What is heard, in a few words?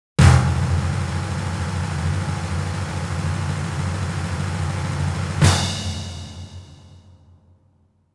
Grand Prize Victory Drum; tanjou s saturn version original soundtrack perfect collection